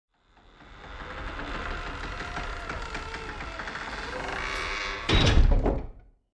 Truck Creaky Back Doors closing

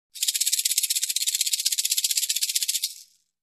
Shaker rattle

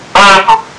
Peacock honk

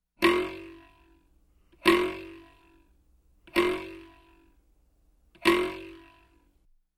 Springboard sound

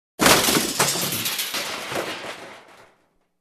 Smashed Debris Sound

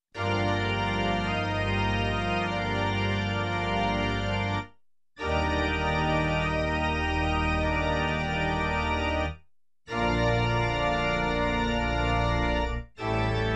Dramatic Full Organ Chord Progression

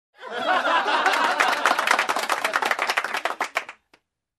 Audience Claps and Laughs

